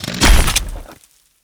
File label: pull claw